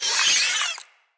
root spider vocal death; Animals; Spiders; Vocals

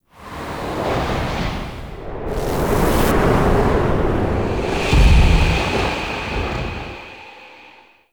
Turn To; Cinematics; Operator; Ballas; Warframe; TheNewWar; Throne Room

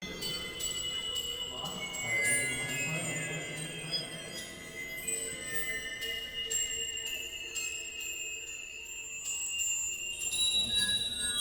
Spirit Tree Magic Essence Loop

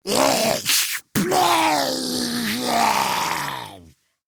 Attack Grineer Ghoul; Grineer Ghoul Expired; Battle Barks

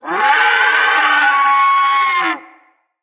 Bird Of Prey Lure Call; Animal Lure; PoE

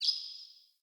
Bird Single Chirp; Pothia Bird

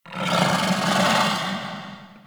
Interior Moan Gurgle, Infested, Ambience; Misc Indoor

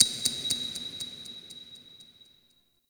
Clock Tick Finish; Warframe; Cephalon Weave

